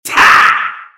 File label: attack; Mad Mage; Mob Voice